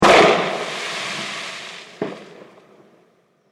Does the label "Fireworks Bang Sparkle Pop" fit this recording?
Yes